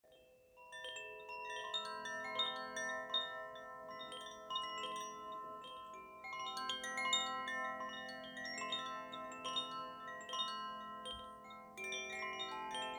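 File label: koshi aria terra, carillons Koshi; carillon koshi terre; tresors esoteriques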